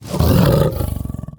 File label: Jaguar Intro; talking tom gold run gamerip 2016